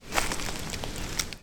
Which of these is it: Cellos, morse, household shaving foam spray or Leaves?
Leaves